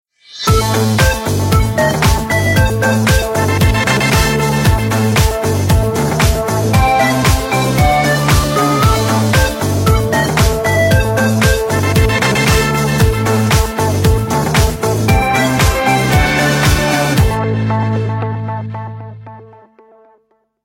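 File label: original ringtones Nokia 5310 XpressMusic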